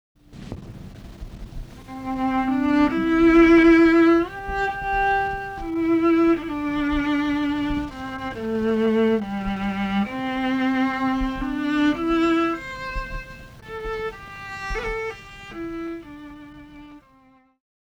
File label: viola declicker